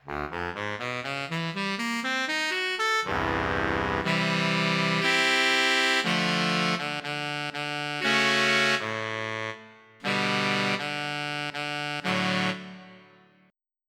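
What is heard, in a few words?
Dry Crisp, tenor sax; SoundFont - Traditional, Voice & Keys - Volume 2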